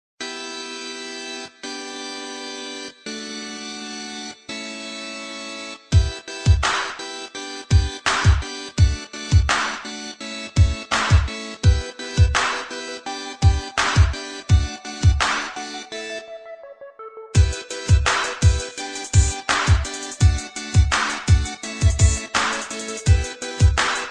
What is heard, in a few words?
Catchy Beat